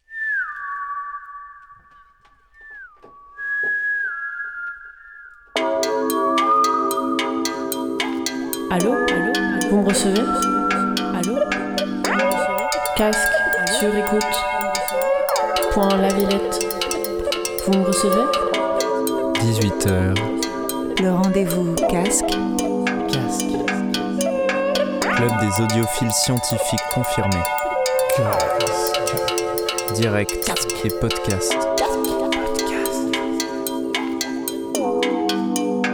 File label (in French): Jingle